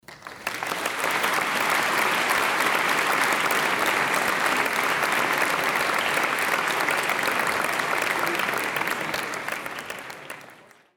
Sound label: Applause